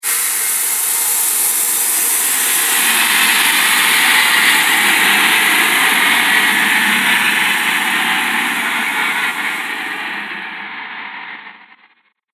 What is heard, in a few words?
Horror Dissonant Air Drone; Scary Soundscape Sound Effects